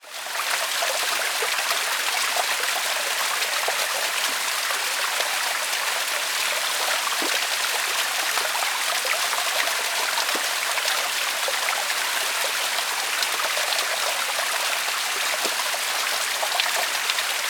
nature waterfall slow running rock face australia; kondalilla

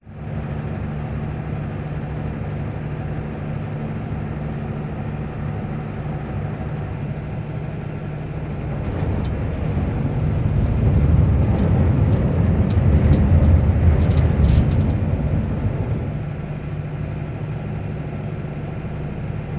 Boat Ferry; audio hero

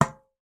sport tennis ball bounce on racket; sound effects one

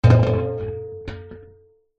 industrial metal canister large empty set down

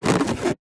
rubber squeak; foley; zapsplat

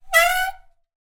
transport bicycle brake squeak loud; zapsplat

